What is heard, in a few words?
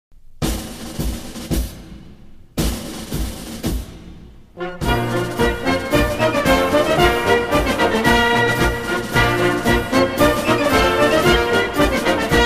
Regimental Band British Grenadiers Escort To The Colours; Duke of Wellingtons